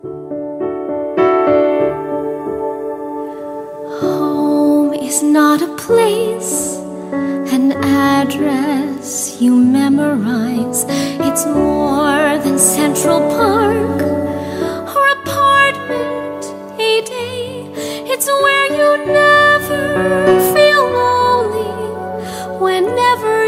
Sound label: Home Vocal Mix